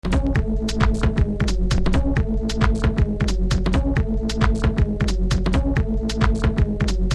loop b (locked groove)

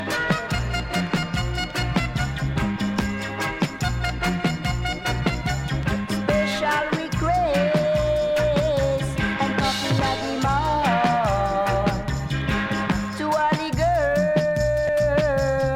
Give Me A Chance (Drum & Bass)

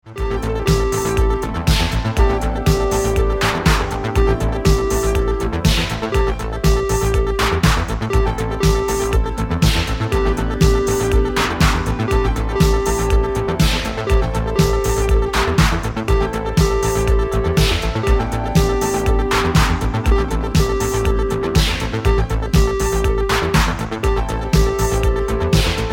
Instrumental

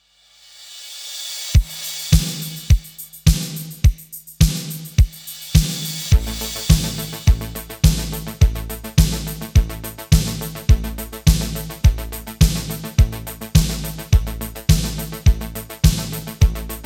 Instrumental

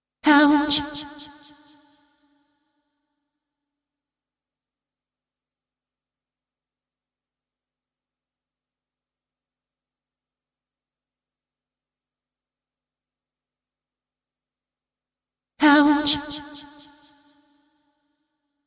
acapella

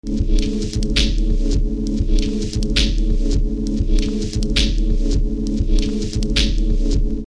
loop d (locked groove)

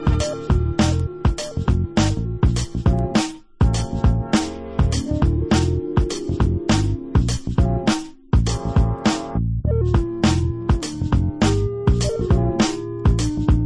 (instrumental)

